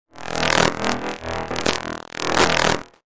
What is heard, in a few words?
glitch sound effect